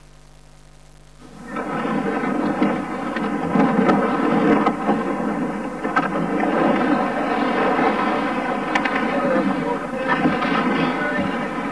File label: boat pushed out